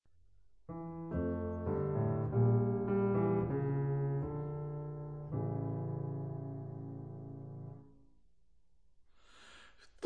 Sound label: Dein Will gescheh bas zang